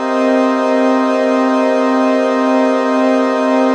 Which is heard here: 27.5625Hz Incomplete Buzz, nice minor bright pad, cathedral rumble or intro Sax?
nice minor bright pad